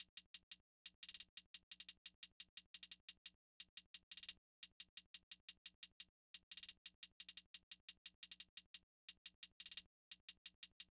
Drum Loop - 175 BPM Hihat; Loop Stems; Cymatics; DOPE DRUMS VOL. 1; Jumpshot

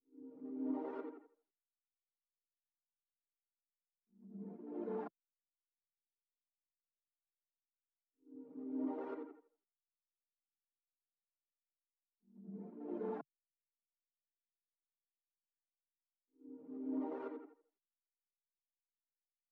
118 BPM G Min Accent Chords; Melody Loops; Loop Stems; Cymatics; Pacha

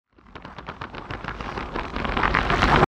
Riser

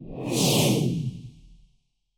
Spaceship FX; Cymatics; Video Game Sample Pack Vol 1

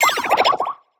Retro FX; Cymatics; Video Game Sample Pack Vol 1

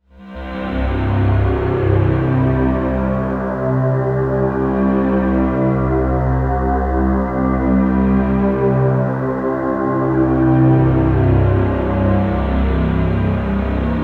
PAD (C), Pedal One Shots; Flattered; Cymatics; ANALOG EVOLUTION Beta

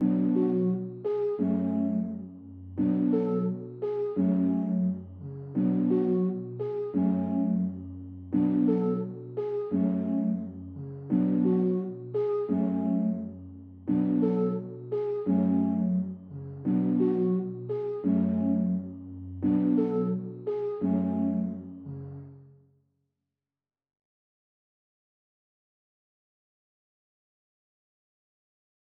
Keys, 173 BPM F# Min; Melody; Cymatics; 2022 Melody Collection (BETA); Pied Piper

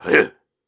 Grunts and Chants, Viking Vocal

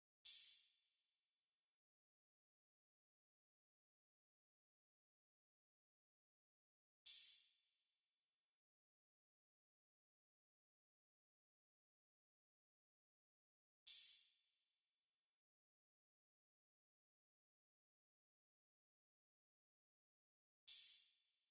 Staggered Drum Loop 141 BPM Open Hihat; Drum Loops; Loop Stems; Cymatics; Mystery Pack Vol 4

